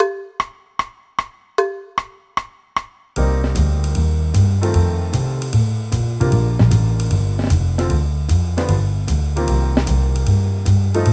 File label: Full Accomp